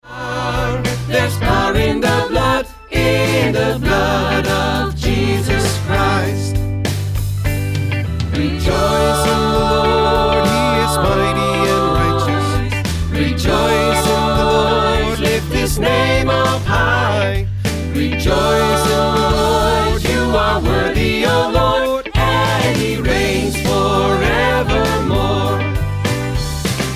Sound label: Gospel Choir; His Word Is Alive